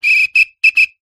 sport whistle soccer x4 short bursts

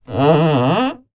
foley plastic grip hand hard movements creaks; zapsplat

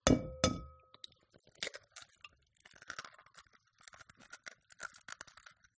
eggs crack bowl

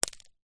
impact stone small drop on stoney ground

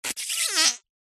cartoon fart airy squeezed out

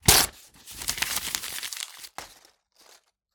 Book Paper Rip Scrunch Pile

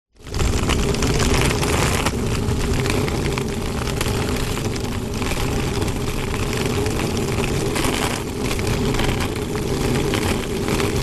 suitcase pull wheels concrete long; foley; zapsplat

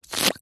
cartoon pull wet item squelch; zapsplat

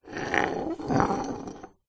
industrial hammer claw scrape drag garage floor

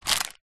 microwave popcorn packet plastic pick up

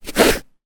shoelace pull through hole on shoe; foley; zapsplat

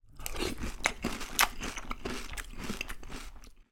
human eating cereal with milk

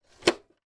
household hi fi system cassette tape deck close

